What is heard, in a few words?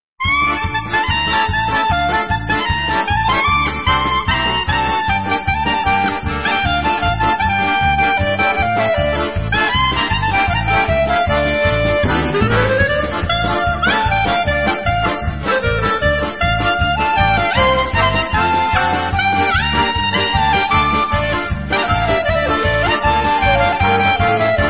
Kleizmer Instrumental